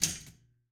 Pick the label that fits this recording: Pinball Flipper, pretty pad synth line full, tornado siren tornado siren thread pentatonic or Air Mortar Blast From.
Pinball Flipper